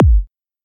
00s reverb kickdrum sound g sharp key, Royality free kick sound tuned to the G# note